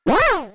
hot; SNES; mario; SMRPG

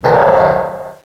gahhhh, Echo (inside caves, underground ect.); DS; ML BOWSERS INSIDE

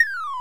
goal; N64; YOSHISISLAND

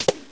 stomp; SNES; SMALLSTARS

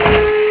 Cannon Prepare; DS; NEWSMB

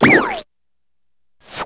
Cartoonish Sound; PC; MARIOTEACHESTYPING2

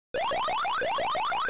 suction; GBA; MLSS

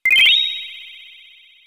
battle heal; SNES; SMRPG

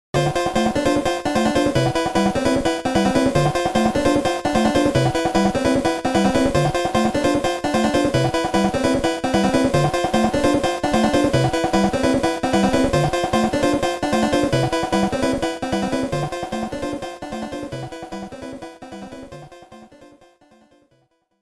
invincible; NES; SMB2

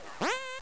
Map up; GAMECUBE; LUIGISMANSION

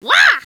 wah; DS; ML BOWSERSINSIDE; Toad

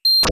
enemy pierce; SNES; SMRPG

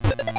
action block; GBA; MLSS

